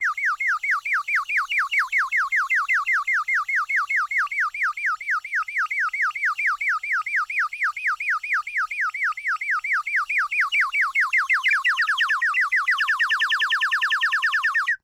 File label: Bird whistle, clay, painted, water, airy, wispy, constant, rise fall, cyclical, flucuating strength; Eiravaein Works; Vaeyan IV